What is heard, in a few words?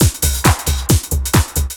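drum loop 135, left; House; Best Service ProSamples vol.10 - House; AKAI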